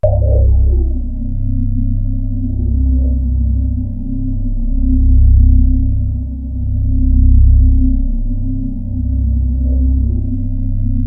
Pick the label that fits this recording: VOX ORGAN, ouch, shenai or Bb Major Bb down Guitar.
VOX ORGAN